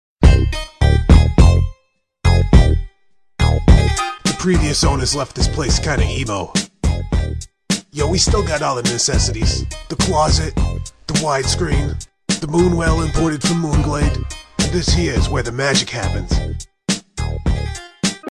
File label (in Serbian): nepoznata muzika